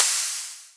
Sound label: crash